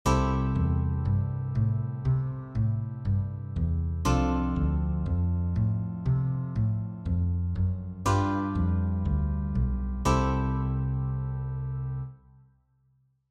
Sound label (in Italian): Esempio arpeggi; walking bass